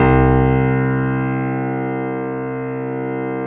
C 1, Keyboards Acoustic, GRANDP ST; USB Soundscan vol.17

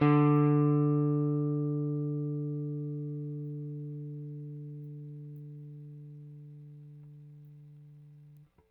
Rickenbacker Bass; Airwindows

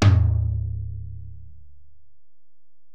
Tom, Alesis DM-PRO, Drum Machines, Toms

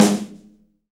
SD Real, Roland; Sound & Vision - Gigapack I

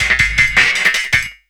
LOOP, 160 BPM, Drum & Bass

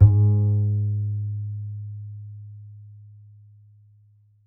A, upright acoustic bass, A string

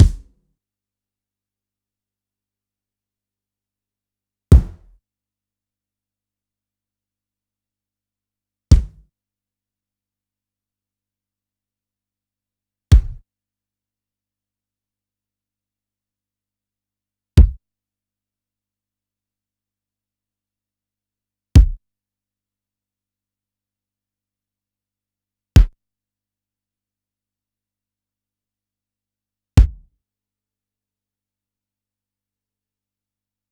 Yamaha DD-65 BD

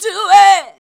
VOX, Singin

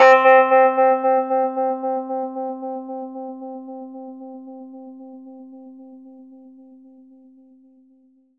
Rhodes fast C, 3D Keys; 3D Sound; 3D Keys VOL-1

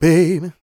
SING, Vocal Planet R&B & Dance; ILIO

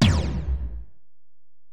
Tom, Alesis DM-PRO

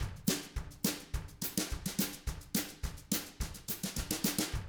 TRAIN, Double Platinum Drums

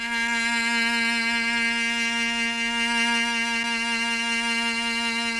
CLN CLO B-3, CHAMB CELLO; Sonic Reality; Vintage Timetraveler

